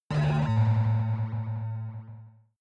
protective shield hit absorption buzz; energie

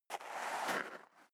step leather shoes gravel light scuff; schritte

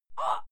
female fight scream short soft; schreie; menschen

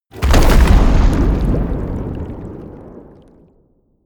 eruption, erdbeben

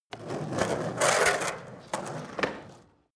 skateboard indoors jump squeak; sport freizeit

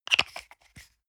toothpaste screw cup close hard; haus-garten; badezimmer

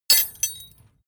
metal tools small drop; berufe; handwerker; soundpool

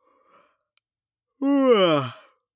male yawn vocal fake; soundpool

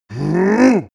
male giant charge; menschen; schreie

